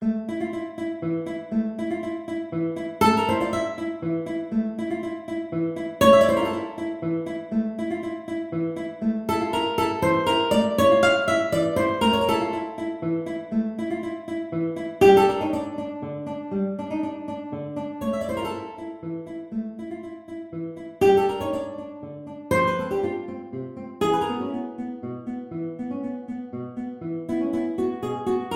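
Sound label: two guitars, guitar duet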